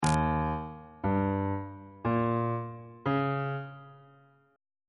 d sharp dominant 7th; g sharp harmonic minor 7th chord 5 is

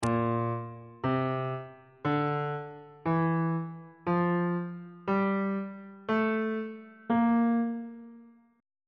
b flat lydian mode note positions on bass clef

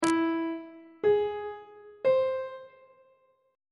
f flat augmented triad chord intervals; on treble clef

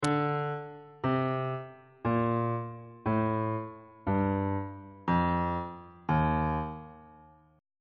e flat lydian mode descending on bass clef

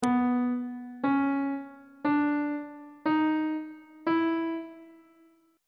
c flat 3rd interval pitches; on treble clef

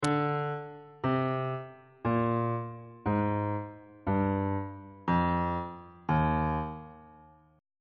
e flat major scale descending on bass clef

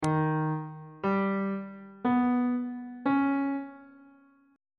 d sharp augmented 7th chord; intervals on bass clef

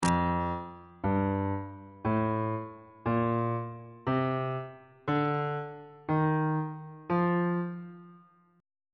f mixolydian mode note positions

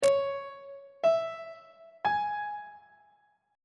f flat major chord 6 is d flat minor; on treble clef

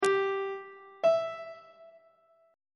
relative minor of g major is e on treble clef

